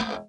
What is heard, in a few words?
db2 release, glowlybass